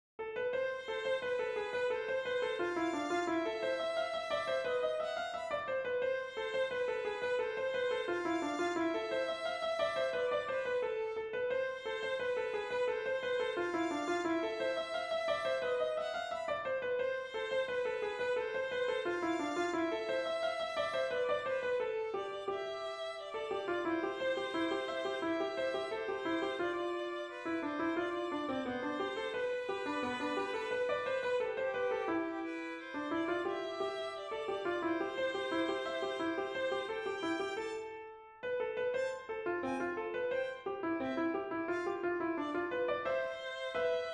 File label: melody harmony; Cindys Trip To Beaumont